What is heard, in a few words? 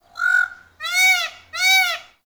Forest Bird Cries; Warframe; Grineer Forest